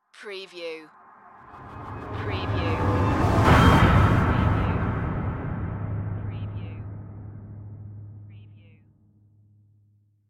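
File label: Production Element Stab Music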